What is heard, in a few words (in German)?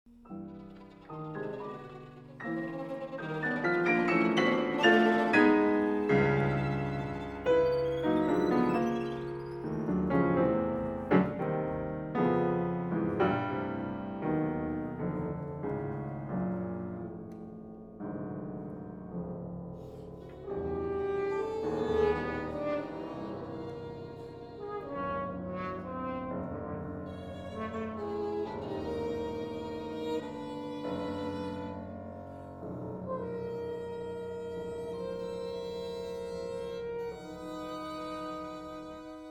Ligeti Horntrio